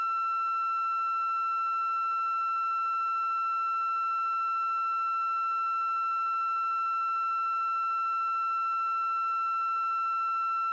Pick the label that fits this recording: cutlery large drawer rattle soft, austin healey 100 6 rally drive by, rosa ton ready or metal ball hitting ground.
rosa ton ready